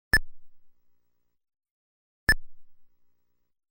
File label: Letter Copying Sound; Kaijuu Densetsu The Tale of the Shell Monsters